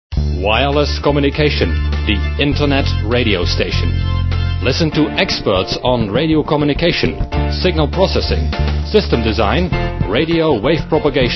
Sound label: rock, radio